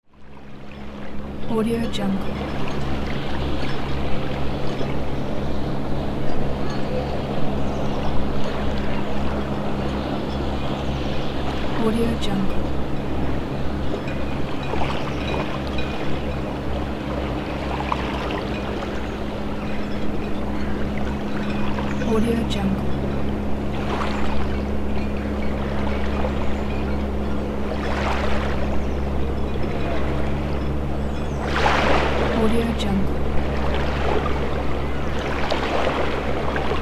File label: harbour ambience